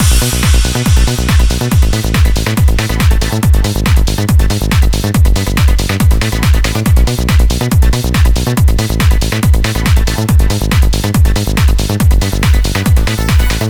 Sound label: Music menu loop; itgmania